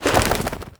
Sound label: wings hop, pigeon; critters